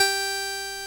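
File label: ORG Vox G, Continental organ; Continental2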